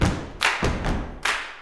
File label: CLAP FEET; Spectrasonics; Vocal Planet; Blues Soul